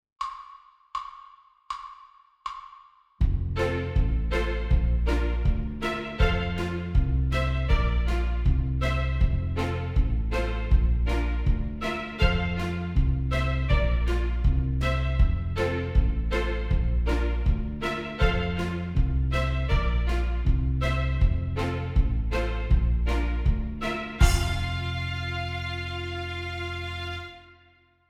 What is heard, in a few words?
Two Note Slurs Horns in Fifths